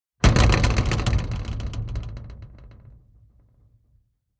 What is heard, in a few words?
Metal blind frame shake rattle; blinds